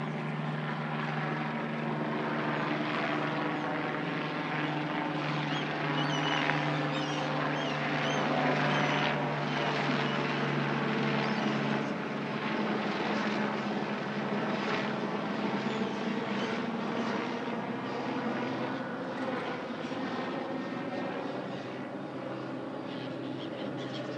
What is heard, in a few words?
helicopter and black headed gulls along river lea haringey